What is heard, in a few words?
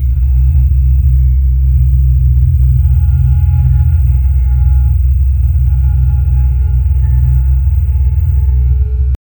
intense buzzing vibration, mobile phone